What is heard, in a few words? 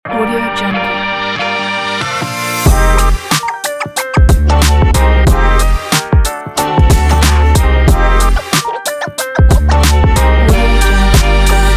upbeat sport hip hop